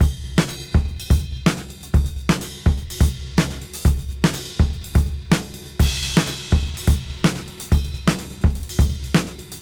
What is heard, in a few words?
Disk 125 BPM; AMG - Keith LeBlanc Phat Butt Jams